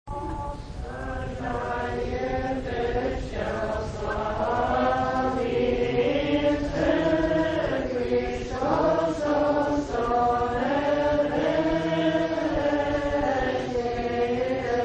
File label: irmos